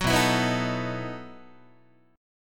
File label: B 11th Guitar; strummed